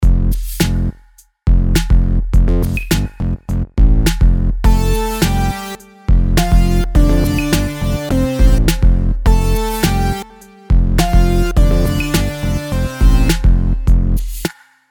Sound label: melodies simple 4s Fmaj; melody